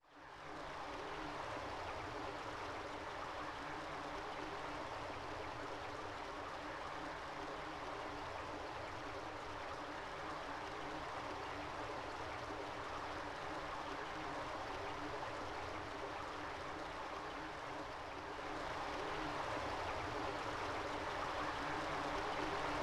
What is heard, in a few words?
Ambience